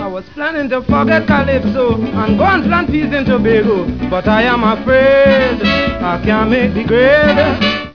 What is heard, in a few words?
bassman